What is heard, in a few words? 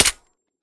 mech, glock; weapons; Urban-Coalition; Decay; arccw ud